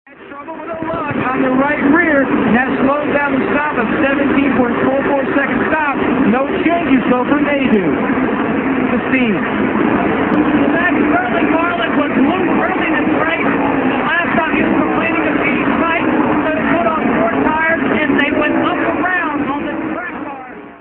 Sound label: nascar